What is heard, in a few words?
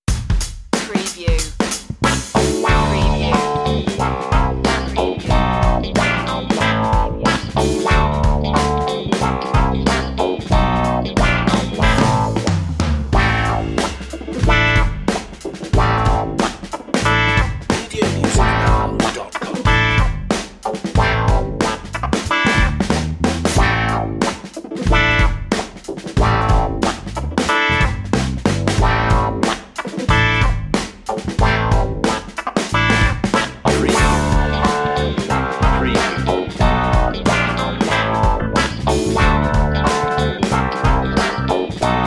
funky punky underscore